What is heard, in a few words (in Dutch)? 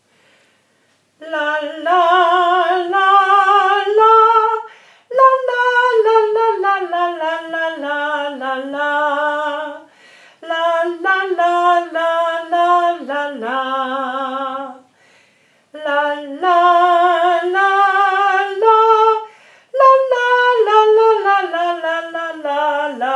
lalala langzaam